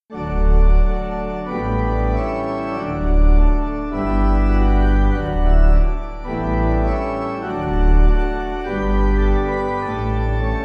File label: Our Father In Heaven, Marsh, Pipe